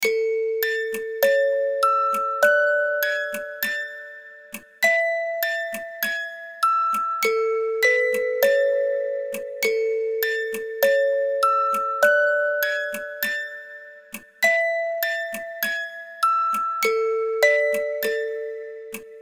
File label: goodnight toddler lullaby music box loop